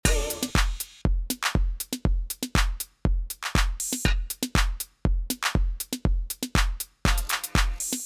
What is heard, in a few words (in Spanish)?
Batería mono